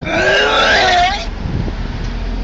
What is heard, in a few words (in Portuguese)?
vomito